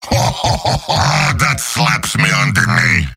heavy laugh long; tf2; mvm

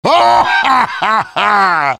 laugh happy; heavy; Garry's Mod; tf2 content

